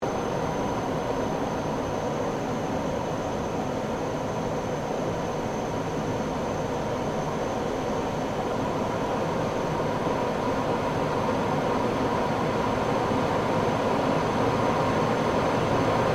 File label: Aircraft 1947 Gloster Meteor F Mk8 Approach Interior, Gloster Meteor fighter jet; Airplanes